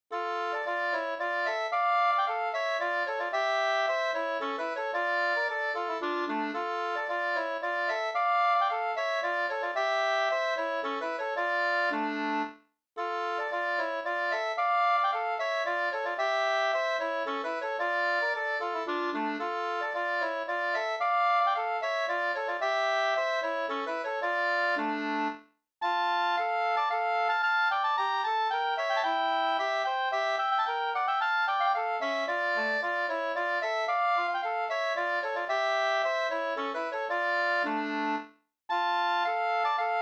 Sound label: polska; e Spel-Gulle e Gullik Falk SvL